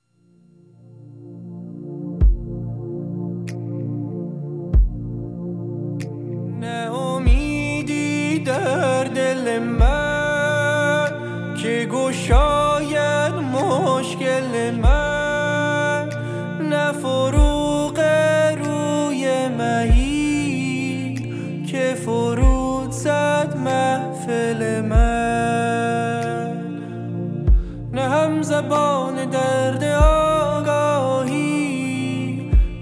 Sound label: Vocal Invocation